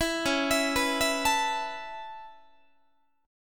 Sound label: Db Minor 7th Sharp 5th Db Guitar; arpeggiated; 4A,4E,5A,5D,5G#,6C#